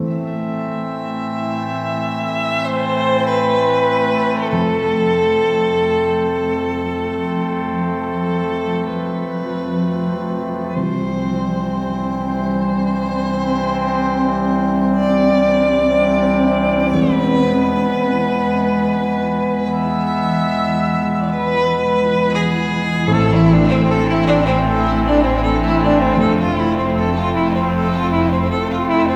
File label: Longing Violin Fiolin